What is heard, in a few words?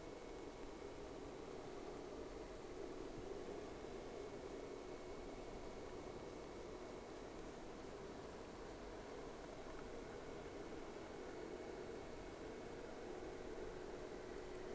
Whales; SeaSnake